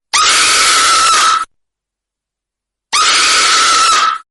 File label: scream sound; scary maze game